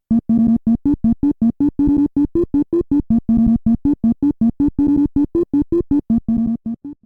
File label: Hammer Theme; DK Arcade; Donkey Kong (game)